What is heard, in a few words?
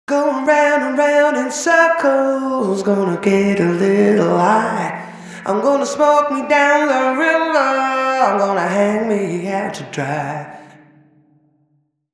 vocal ir stora trapphuset ortf; Impulsrespons stora trapphuset i Kopparhammaren 2 på sång, HT2017 - handklapp